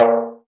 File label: Dof Tak, Roland BK-5 Percussion